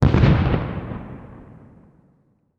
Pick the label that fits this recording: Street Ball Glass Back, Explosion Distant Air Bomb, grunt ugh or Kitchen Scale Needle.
Explosion Distant Air Bomb